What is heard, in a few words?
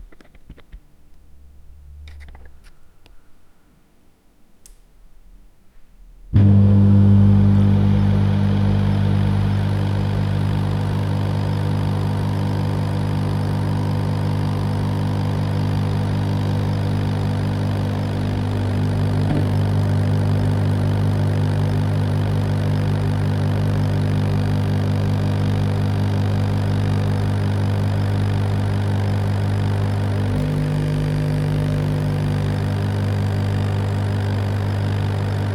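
sound of working microwave, appliances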